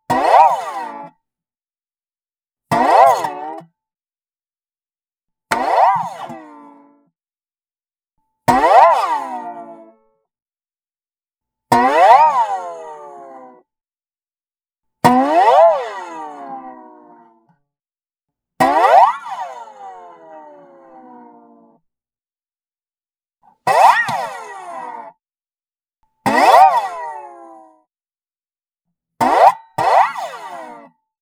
Dobro dual gliss short; SkewSound; The Fool

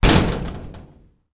Ball Ad Board Metal, Soccer; Stuart Duffield; Soccer SFX

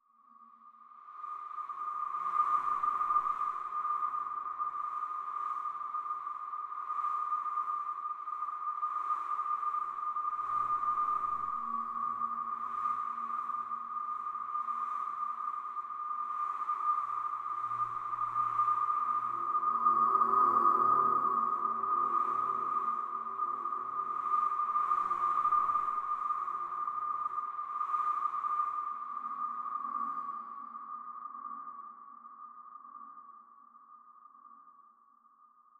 Magnetic Field; 99Sounds; Magnetic Fields

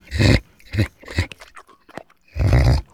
Pony Nicker Heavy, Shetland Pony; Jack Powell; Shetland Pony Vox

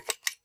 metal Click; Kenney's Sound Pack; RPG Audio

